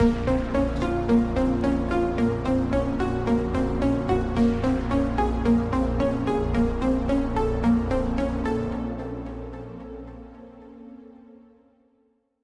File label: Mystery Theme; soundscrate